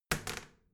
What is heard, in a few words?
toilet seat lid wall open soft; haus-garten; badezimmer